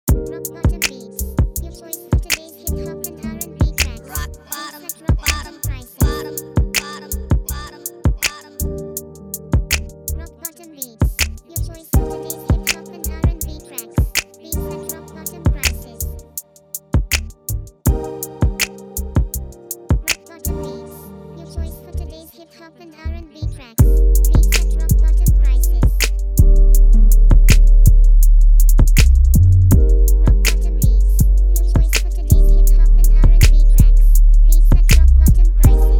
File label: BEAT